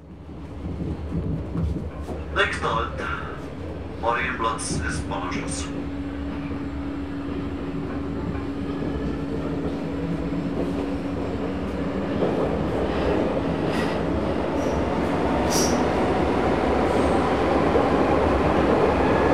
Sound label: train subway interior; best service Blue Box